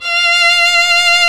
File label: SOLO STRINGS